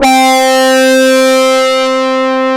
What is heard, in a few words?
MATRIX 12 C4, OB Synths; E-MU Formula 4000 Series Vol. 3; Analog Odyssey